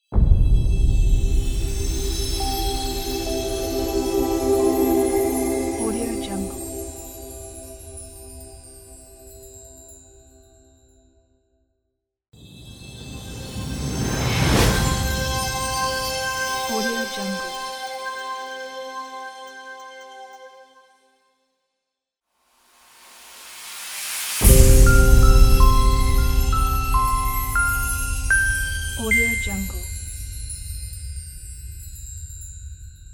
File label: modern logo pack